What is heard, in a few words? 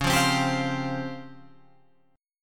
C sharp Major 7th Flat 5th Guitar Open F; C#M7b5; strummed